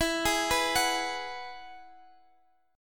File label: E Major Add 9th, Guitar Half Step Down